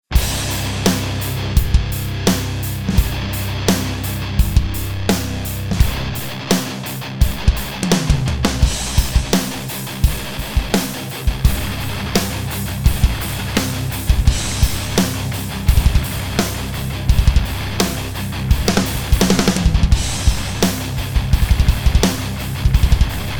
Tama SuperStar Kick; Drum Werks

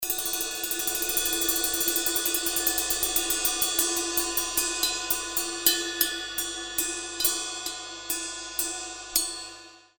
Paiste 2002 Rude Ride

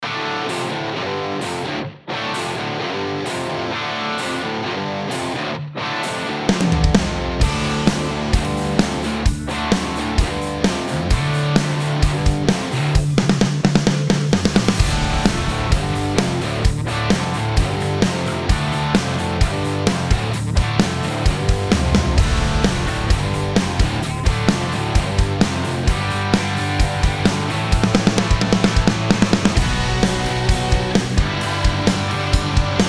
Ludwig 5x14 Black Beauty Snare Drum Dry

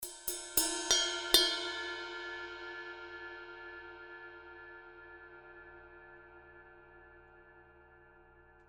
Zildjian K 20 Ride

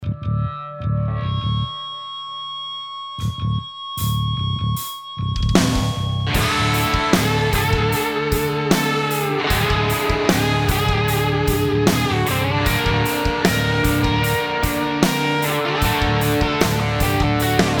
Ludwig 5x14 Acrolite Aluminum Snare Drum

